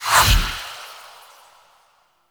Light Teleport; Warframe; Wisp

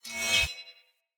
Dagger Heavy Whoosh Wind Up Accent Long; Weapons; Player; Swords; Fey

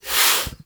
Pet Snort; Enemies; Warframe; Catbrow; PetShip

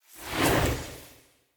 Staff Caster Cast Long; Weapons; Staves; Starter Staff Caster

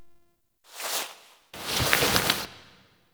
Balance SFX; Kill Her Killer; Warframe; NineteenNinetyNine; Stage8ShitboxConvoy

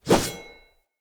Short Sword Whoosh; Weapons; Enemy; Swords; Short Sword Elite

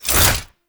Whoosh, weapons; Gunsen Prime

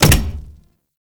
Step Default

